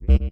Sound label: synth